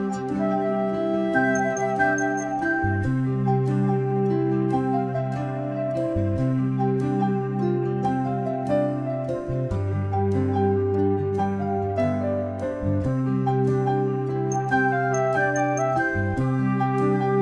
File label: instrumental LOW